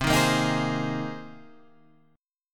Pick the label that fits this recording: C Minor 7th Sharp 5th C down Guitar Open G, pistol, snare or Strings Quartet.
C Minor 7th Sharp 5th C down Guitar Open G